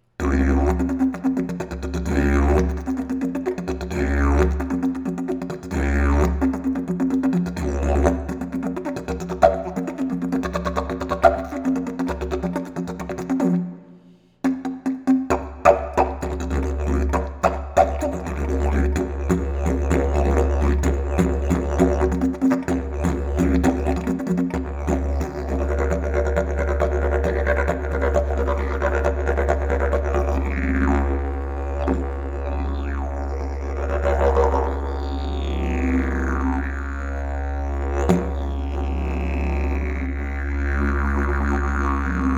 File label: didge